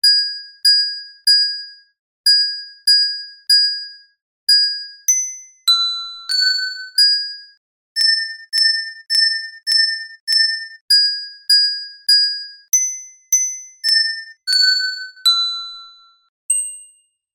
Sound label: melody